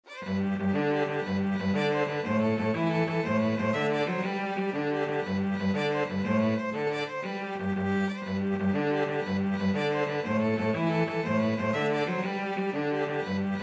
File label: irish jig medley violin cello duet